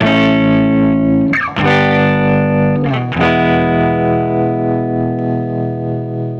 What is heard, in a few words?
150BPM Guitar Clean E min; Antidote Advent